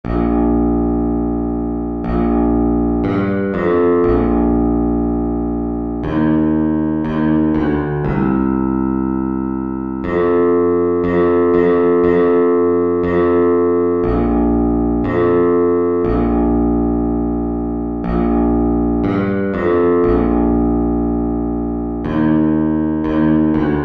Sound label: bass